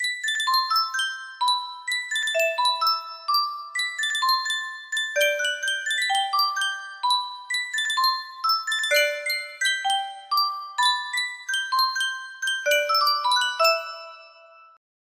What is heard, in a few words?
Sankyo music box, music box melody